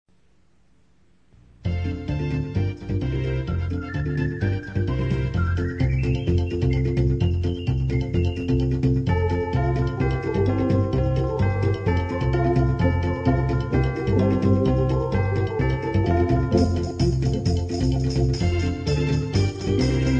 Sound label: Westminster Cha Cha, hammond